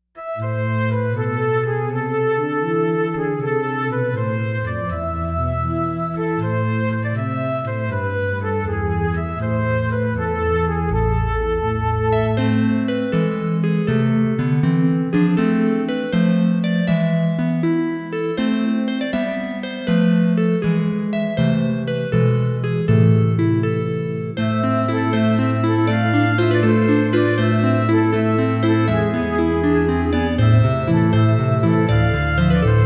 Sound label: Fragmented Flute